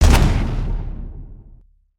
charge

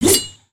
spear, weapons; aronwk collection; ndaudio

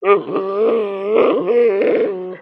Pets Bear Panda Angry; creatures pets; Lego

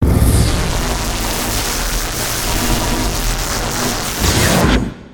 Shock, emotes; Emeperor

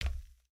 Foot Steel Boot Walk, footsteps

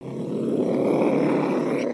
Pet Cat Growl; creatures pets

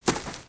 Footstep; Lego; Strombie; creatures organic

